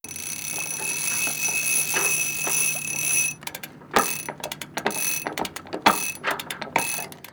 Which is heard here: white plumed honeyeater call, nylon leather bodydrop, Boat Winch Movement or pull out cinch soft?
Boat Winch Movement